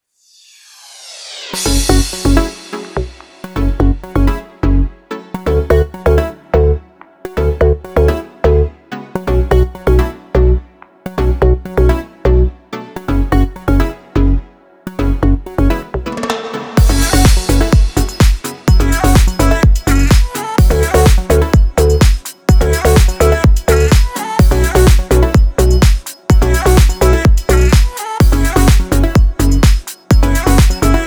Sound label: house electronic techno; By Burak Sound Bank; house electronic techno vol 5